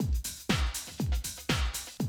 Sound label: 120, Classic Drum Loops